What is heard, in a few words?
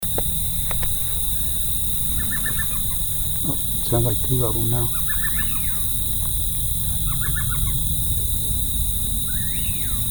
Elf Owl